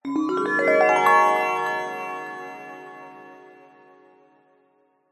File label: magic glissando ascending bright warm twinkle